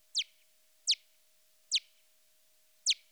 natterers, bat

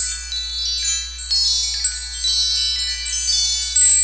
allegro, windchimes

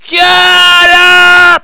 scream shut up

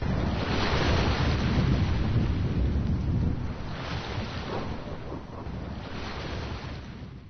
waves seashore

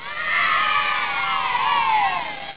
kids cheer

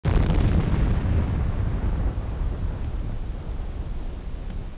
explosio

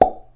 classic cartoon pop sound

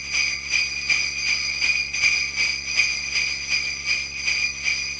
sleighbells

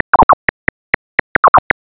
pallid bat social

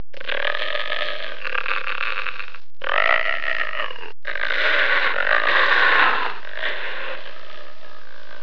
monster growl

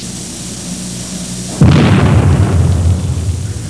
blast, explosion sounds

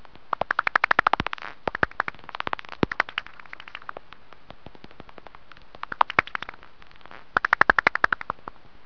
pipistrelle, bat sounds

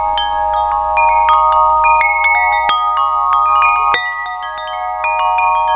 Alto, windchimes sounds

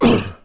vocal grunt pain ugh

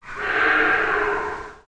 monster

